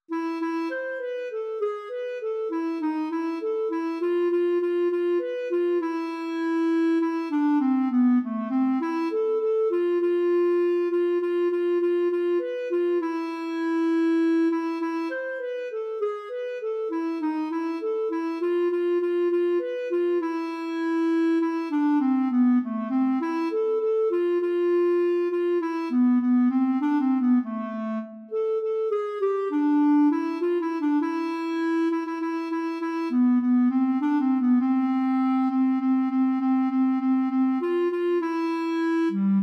Polska

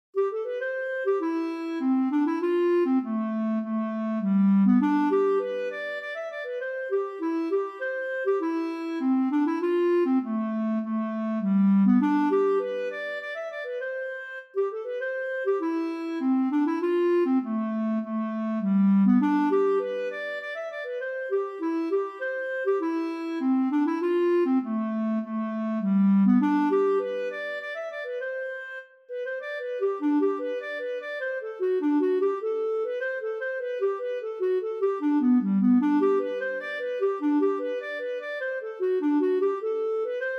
polska

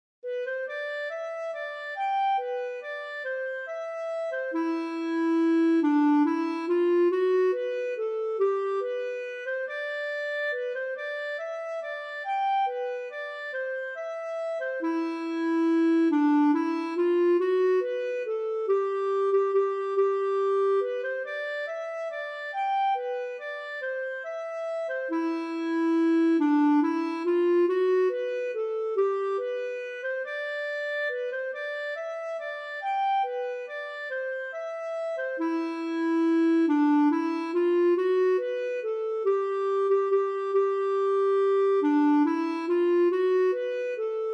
vals

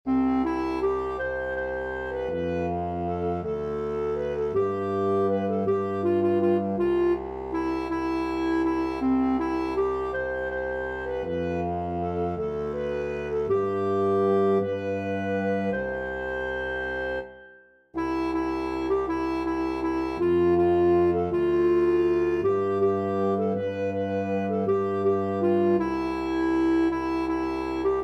med bas; Rosa pa bal

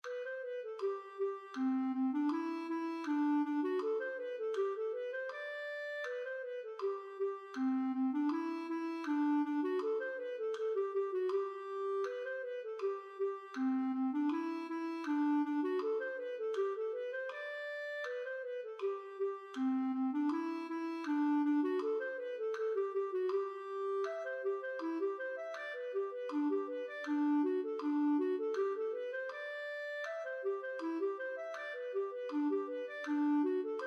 Solskensschottis

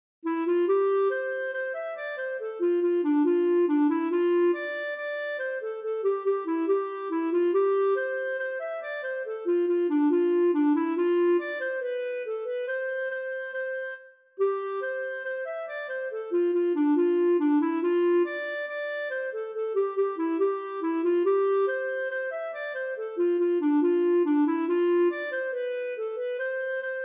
schottis

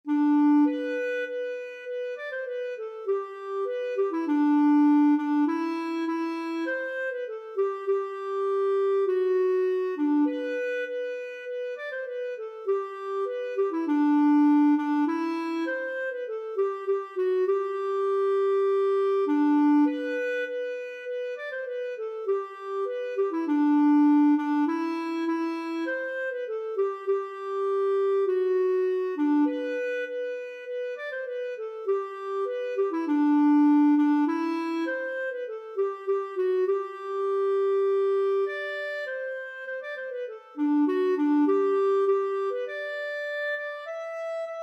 Brudmarsch; Barkarby

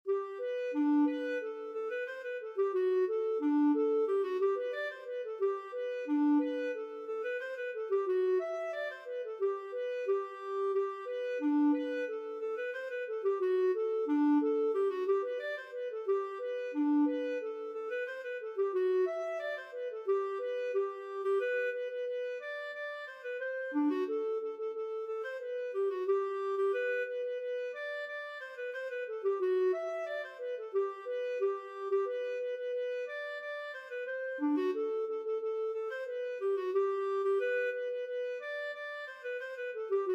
polkett

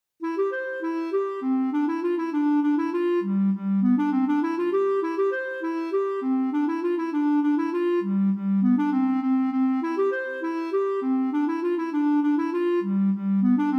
Polkett

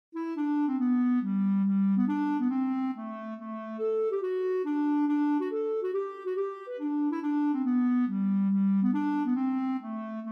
schottis

